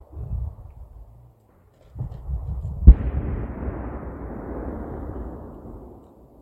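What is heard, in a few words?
fire then explosion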